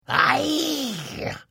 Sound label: Hit, Vo; The Elder Scrolls III - Morrowind; Morrowind GOTY GOG